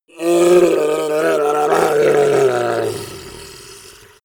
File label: goblin groan painful weak; schreie